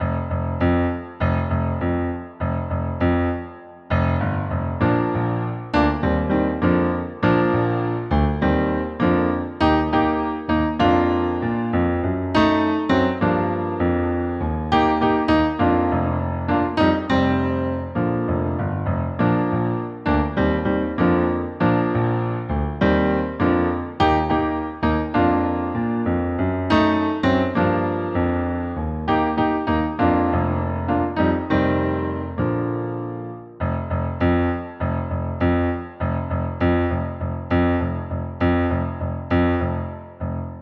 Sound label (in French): le joueur de bongo playback; audios entrainements